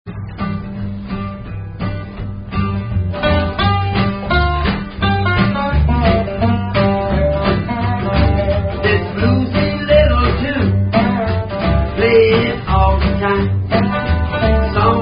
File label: Bluesy Little Tune